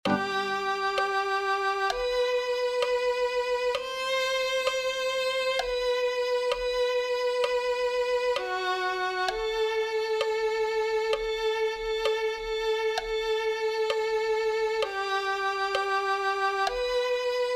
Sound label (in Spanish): violin